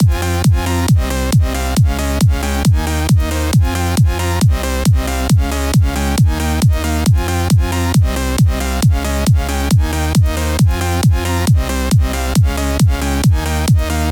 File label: Bass and Kick mix